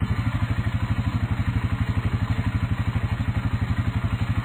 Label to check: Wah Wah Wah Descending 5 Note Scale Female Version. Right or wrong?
Wrong